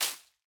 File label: Leaf litter place; Ściółka